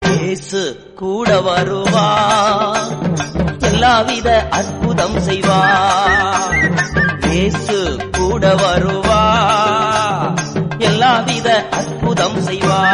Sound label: Tamil Christian Song